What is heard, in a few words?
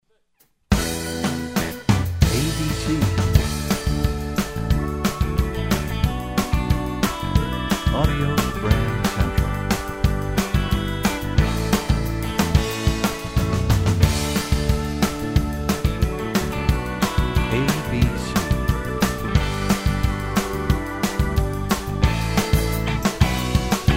American Country, radio jingles